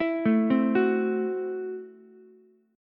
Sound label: Gb Minor 7th Flat 5th, Ukulele; arpeggiated; JGuitar